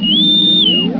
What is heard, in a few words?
WOBBLE; HIGHTECH